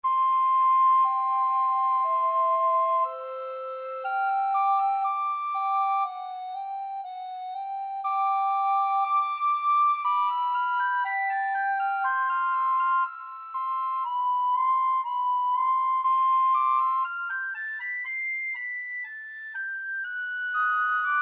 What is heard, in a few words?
recorder duet